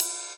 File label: Hi-Hat; Sonic Foundry (Sony Creative Software); Rondo Brothers Trip-Hop Loops & Samples